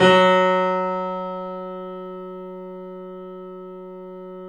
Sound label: F#, The Piano; InVision Interactive - The Piano